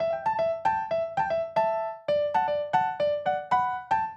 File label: Acoustic Piano